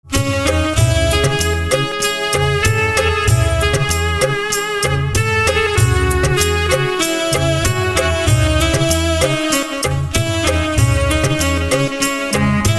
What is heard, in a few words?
Saxophone